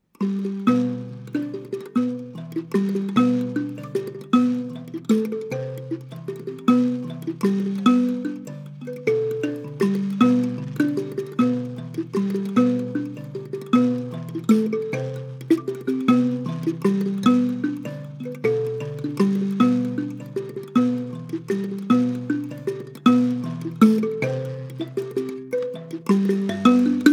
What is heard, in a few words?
Mbira solo; Nyamaropa